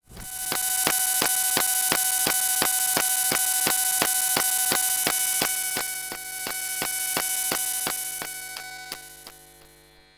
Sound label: Long Buzzing Power Glitch Clicks